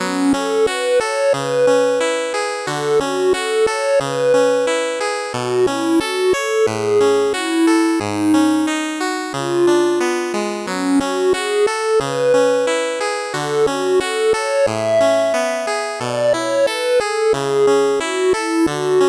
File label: 8bit bgm normal